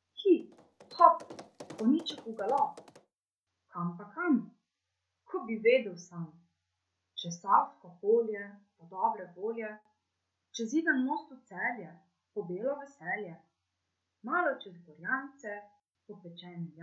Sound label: Hi hop